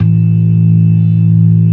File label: ORGAN, C3 NO PERC; Keith Emerson Signature Series